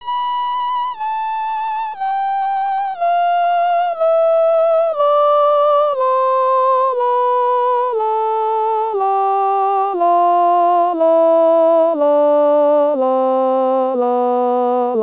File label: Alto Voice